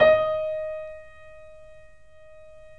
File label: 3D Pianos Yamaha C7; E-MU Producer Series Vol. 5